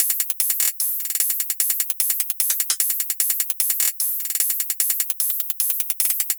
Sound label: Future Bass Hihat Loop 150 BPM; Drums; Top